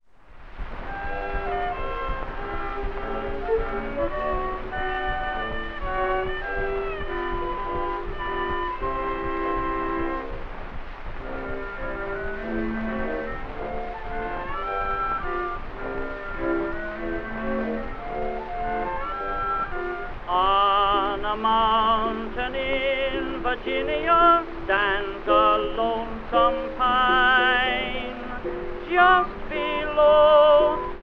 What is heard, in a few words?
tenor and chorus with orchestra